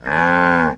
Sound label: Cattle, Cow Moo, Bellow. Single Low Moo Bellow, Short. Close Perspective.